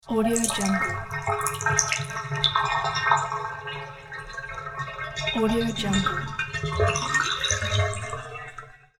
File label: water pipe